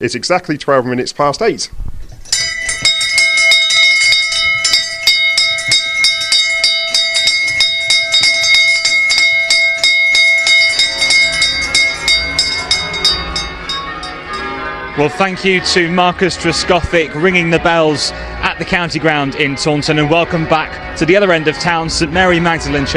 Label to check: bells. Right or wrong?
Right